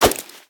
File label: Dodge; Fight Knight; cowboy